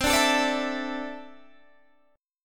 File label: D Suspended 2nd C; D2/C strummed